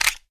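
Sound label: magazine out, glock18; cof; guns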